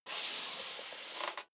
step leather shoes metal dry slide long; schritte

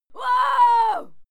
female fight scream

